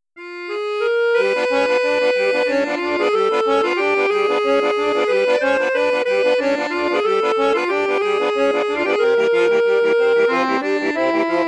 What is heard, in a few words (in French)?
registre basson flute juste, accordéon; hohner vivaldi